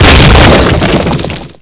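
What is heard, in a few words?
explosion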